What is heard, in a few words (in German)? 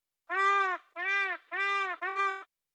Ruf Hesperornis